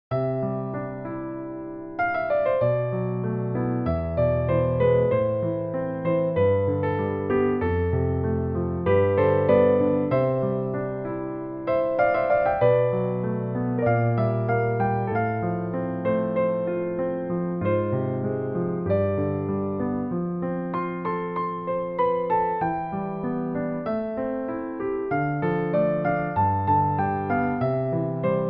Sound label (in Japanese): aeta uresisa, bgm